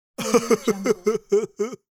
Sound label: man nerdy laugh